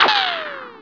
shot; BESTSHOT; noisybit

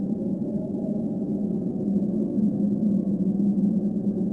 dead wind; ambient; Shadow Man

